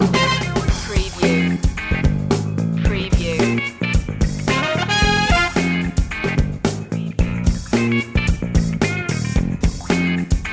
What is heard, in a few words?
groove loop